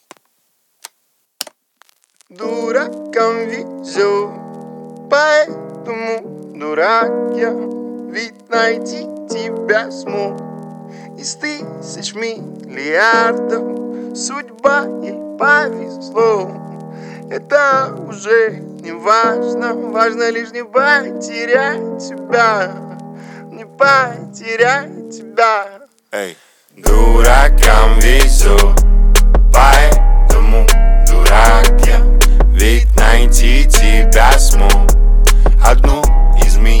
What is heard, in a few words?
35-37-39hz, Screwed